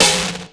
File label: sn d&b; 300 Drum Machines; Yamaha Motif ES-Series